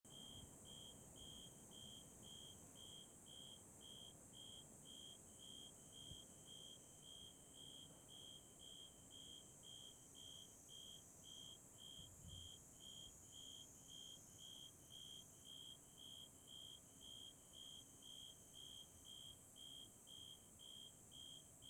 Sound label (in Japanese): 鈴虫鳴き声; stereo; insect sounds